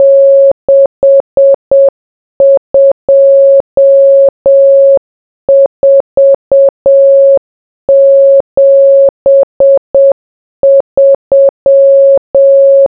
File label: morse